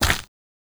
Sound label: walk gravel